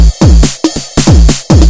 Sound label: 140 Step